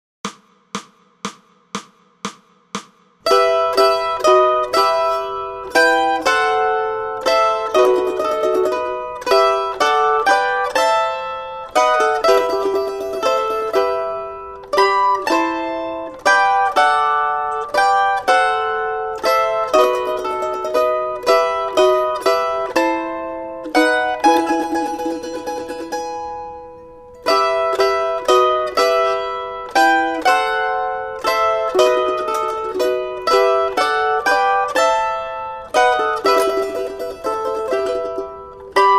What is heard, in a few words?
Mandolin; Coventry Carol